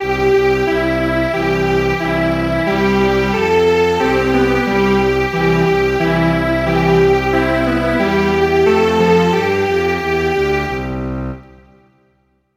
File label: Strings Quartet